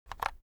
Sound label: foley office keyboard external shortcut short; smartsoundfx